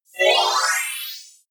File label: appear sparkle short